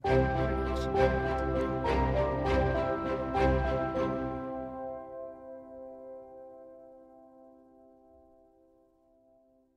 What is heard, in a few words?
Sweet Ident; SoundsCrate